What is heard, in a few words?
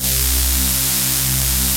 P CUTTERS, synths; Pro-Rec - Synthsonic